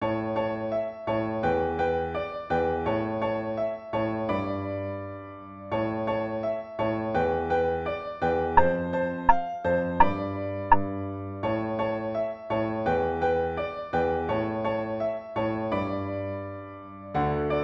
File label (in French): violon